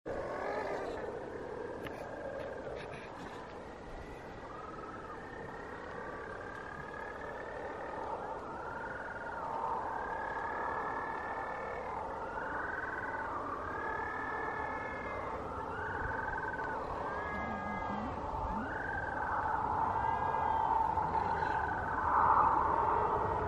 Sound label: Penguin Noises; australia; Lillico